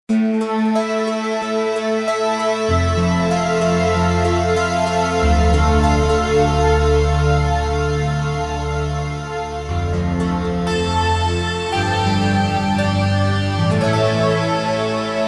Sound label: Stratosphere, Roland JV-880; Factory Patches